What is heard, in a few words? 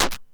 Percussive Hit, contact mic